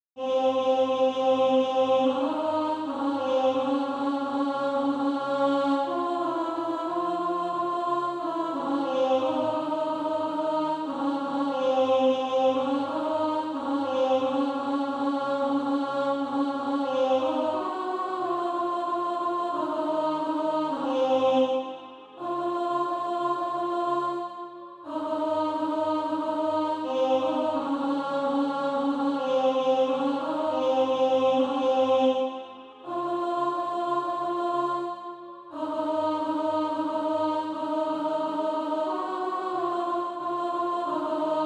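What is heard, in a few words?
alto, Practise Music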